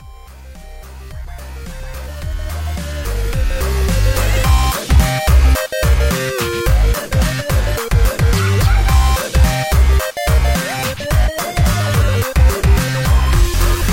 Tone Fade; Kirby

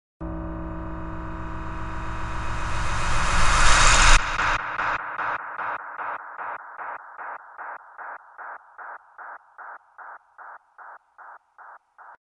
Suspense Sound

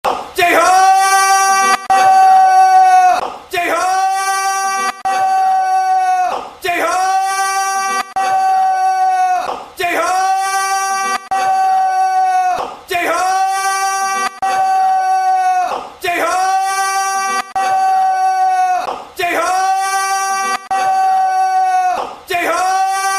screaming; Suga; Jhope

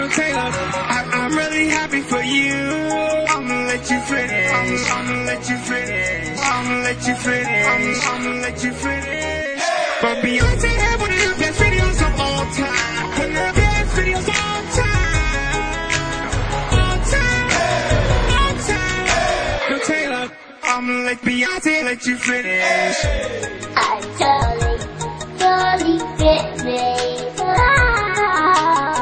Auto tune mix

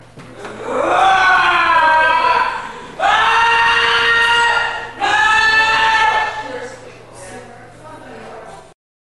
Evp screams

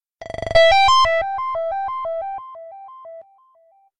Android SMS

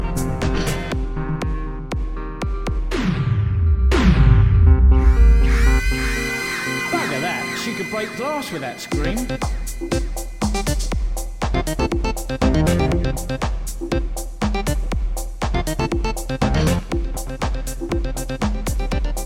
Siren; Killing Floor

